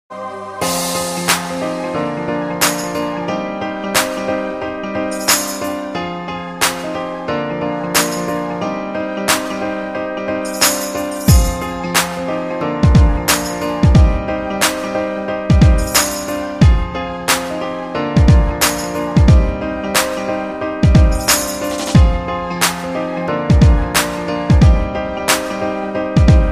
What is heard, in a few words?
remix instrumental bgm ringtone english ringtone best bgm